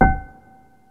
PIPE; BEAT2000

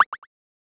DRIP; KRONDOR

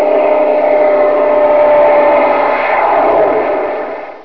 AIRPLANE; WAR

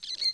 RAT; KRONDOR

